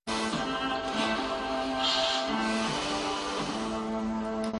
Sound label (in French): musique de fond